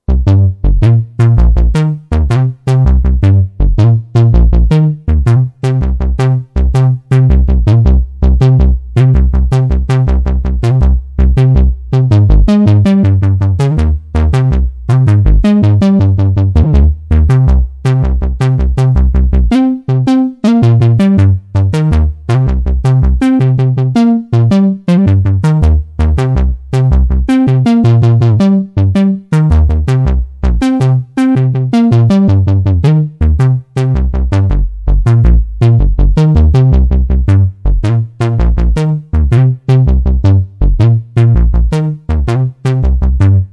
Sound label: bassline